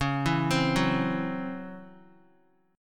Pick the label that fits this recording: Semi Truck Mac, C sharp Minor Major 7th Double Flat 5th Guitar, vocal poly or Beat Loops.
C sharp Minor Major 7th Double Flat 5th Guitar